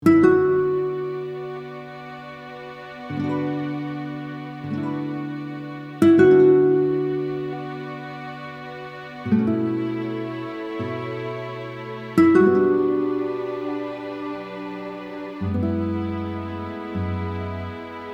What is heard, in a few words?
Spanish Wedding; music intro